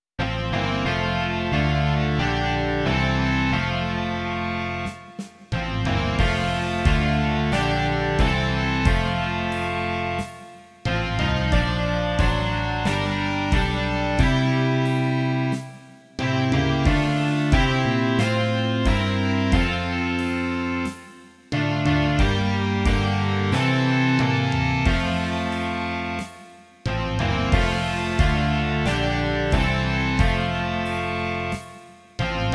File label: rock